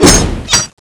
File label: slash, weapons; crow9; cstrike